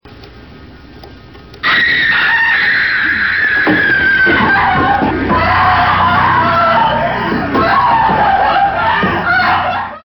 SCREAM

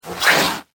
snake hit

